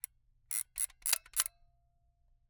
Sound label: Camera, Aimex SP500, point n shoot, plastic, wind film, ping; Eiravaein Works; Vaeyan IV